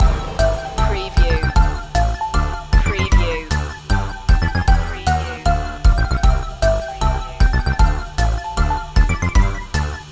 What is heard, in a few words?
retro video game circus loop